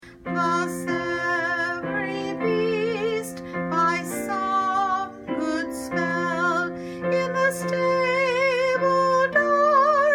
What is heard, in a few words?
SOLO; Friendly Beasts